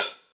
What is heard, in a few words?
smooth pedal hi hat sound d key; hits one shots; hat samples